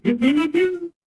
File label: feedback confirm